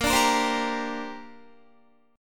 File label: A sharp 6th Add 9th, Guitar; A#6add9; strummed